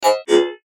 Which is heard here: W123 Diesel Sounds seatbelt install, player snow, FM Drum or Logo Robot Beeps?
Logo Robot Beeps